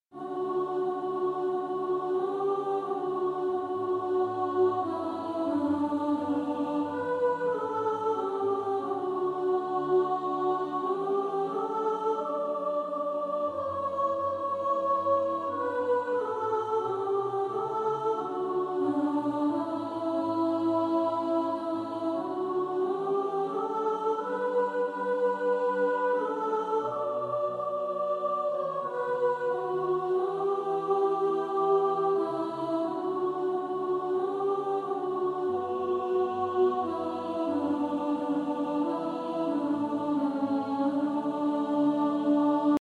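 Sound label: soprano, practice music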